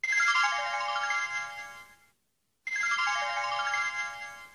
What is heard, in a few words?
bell ringtone; samsung sgh t100